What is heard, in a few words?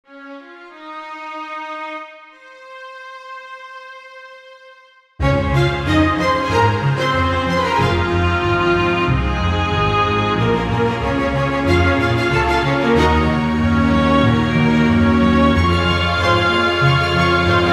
String Quartet